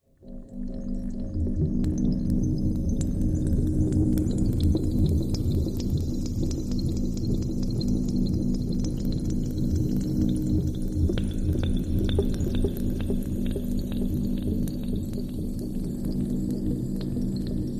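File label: Dripping Cave Wet Electric, eerie ambience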